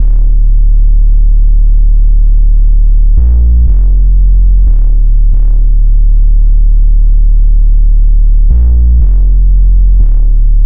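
loop 90 hybrid bass magnetic D minor electronic pop island future afrobeat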